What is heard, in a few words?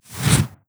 spell cast; wand